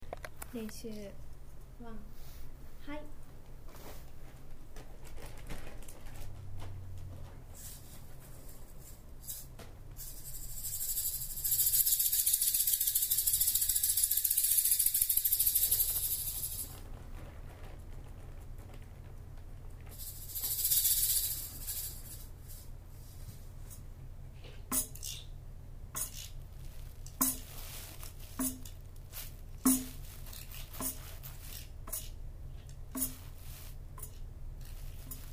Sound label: naramachi sounds